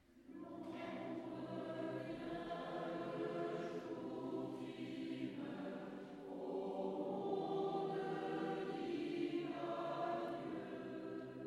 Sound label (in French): Choeur La Prière des Frères Moraves